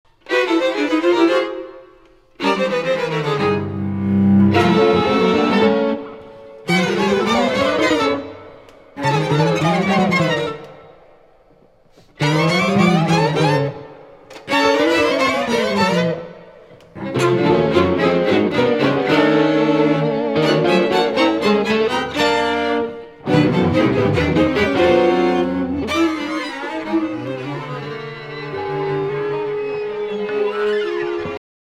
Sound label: drum music